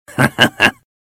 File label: snow jump; froststalk